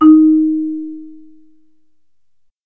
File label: Marimba Hit